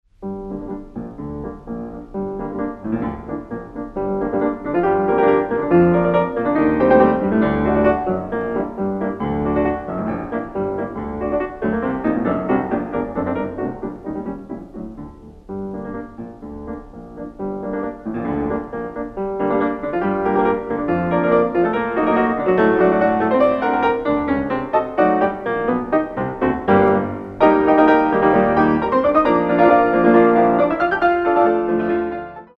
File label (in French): Prélude en sol mineur; RACHMANINOV; n° 6; n° 5